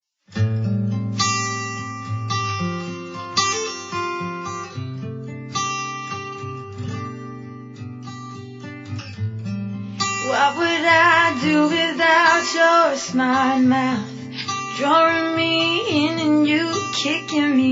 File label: Acoustic